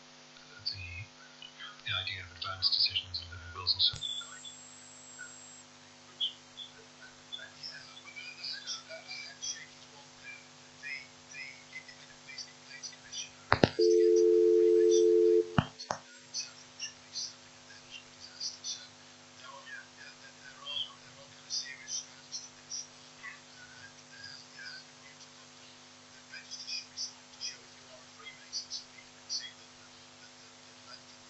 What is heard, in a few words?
recording switch on signal remote detail